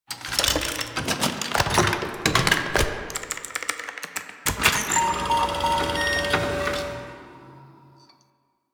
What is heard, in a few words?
Fixing Computer; Entrati Labs; Loid